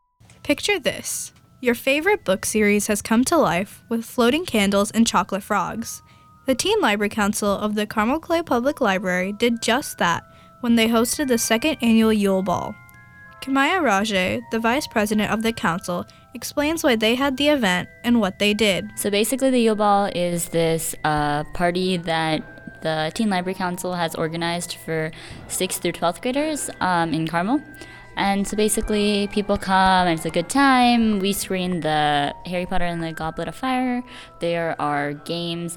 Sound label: BEAT